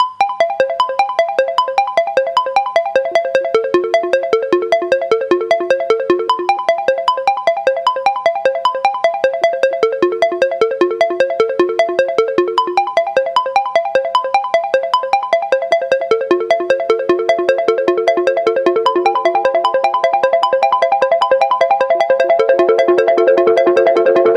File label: ring, ringtones; sound of oo website ringtones